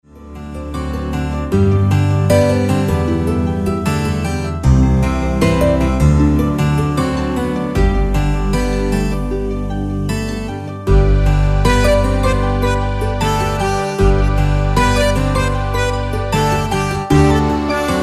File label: hawaiian guitar